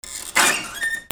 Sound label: RIPPING